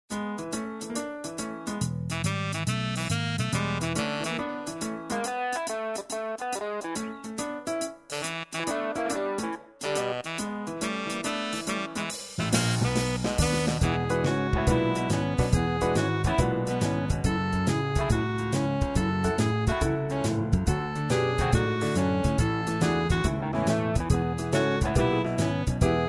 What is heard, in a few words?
Instrumental